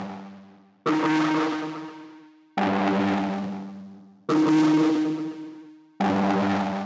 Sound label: Kit 140BPM Noizy Synth DRY; G minor; Melody & Synth; Vengeance Samples; Space Of Time Kit